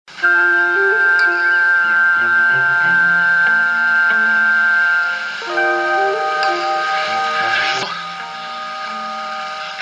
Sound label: funny theme